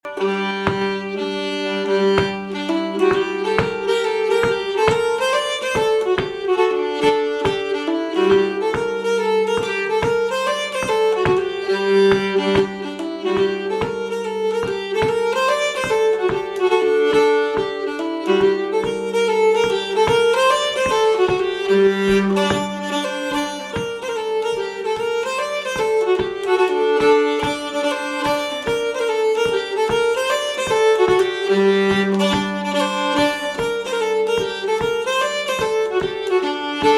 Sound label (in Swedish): polska; Bockeluras; Bohuslan